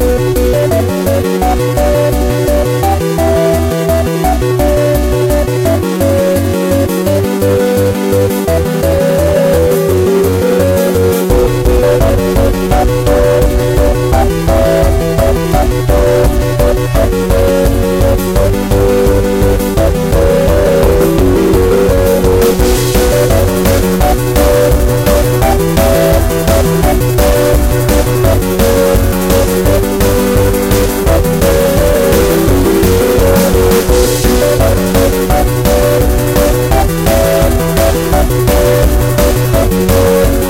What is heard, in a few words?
Rocksurf